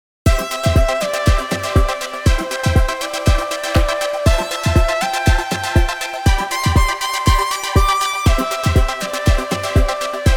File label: Harp, EDM Pop